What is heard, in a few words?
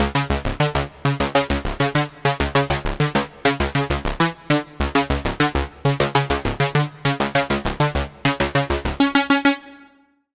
Pluck, Sylenth1; Routed Sound; Sylenth1 Expansion - Doom Carpenter Vol.1